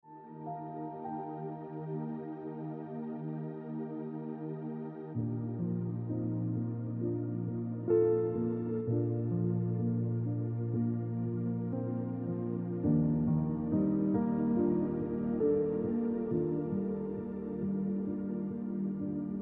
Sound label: Piano Music